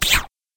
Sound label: BLAST